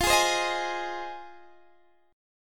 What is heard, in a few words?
F Minor 7th, F, Guitar, Open D